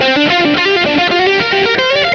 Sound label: guit lead; Sonic Foundry (Sony Creative Software); Ugly Remnants VOL-2; Leads